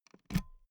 pull out euro plug hard; objekte; elektronik

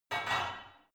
barbell only replace reverberant

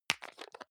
hygiene small screw cup open hard, badezimmer

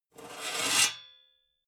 metal sheet scrape; metall

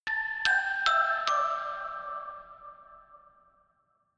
notification glockenspiel creepy sequence descend

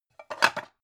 pan stack slide

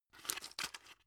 drug put in; berufe; arzt

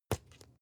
spice jar smack open slow; industrie wirtschaft; gastronomie

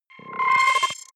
button, signale; soundpool

